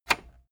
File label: cassette eject push